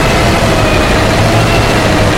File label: angler attacking; Memes